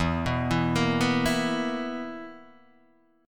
B Major 7th Suspended 4th E

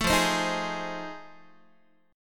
F 7th Sharp 9th, Guitar; F7#9 strummed; 12,13,15,13,12,x

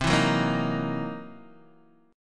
C 7th Sharp 9th Flat 5th C Guitar Open D

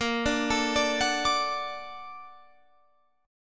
A sharp Augmented Major 7th, Guitar Open F; arpeggiated; 22,21,21,21,21,21; chord

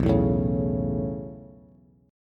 B 9th Suspended 4th B down Bass; B9sus4; strummed; JGuitar

